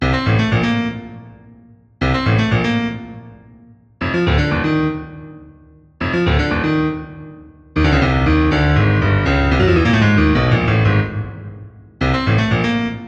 Underground; Super Mario Bros.

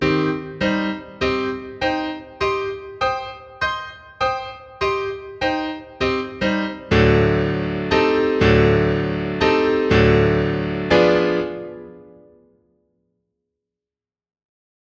piano

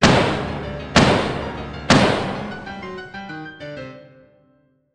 Fanfare

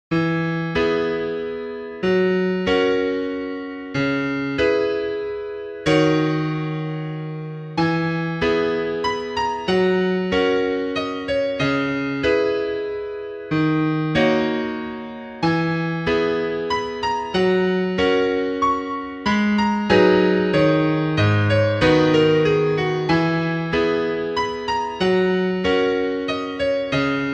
Waltz

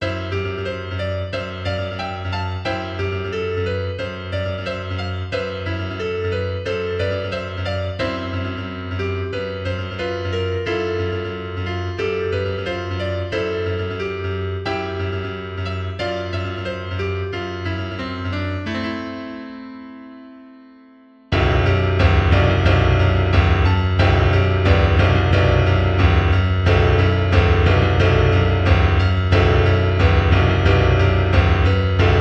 drum

